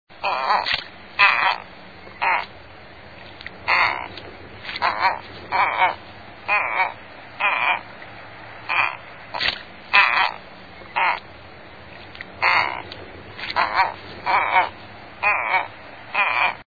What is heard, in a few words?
Reddish Egret